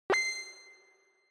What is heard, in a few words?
Inspiration High